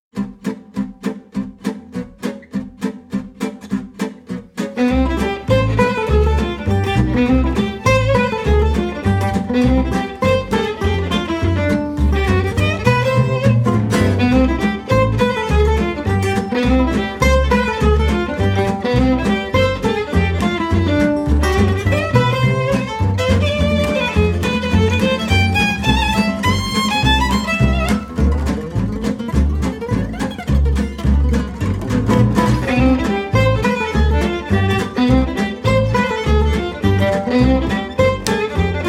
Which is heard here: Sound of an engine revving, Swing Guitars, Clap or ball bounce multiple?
Swing Guitars